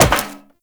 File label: Wall Jump Metal Perforated; Footsteps; WallRun; Warframe; Characters; Tenno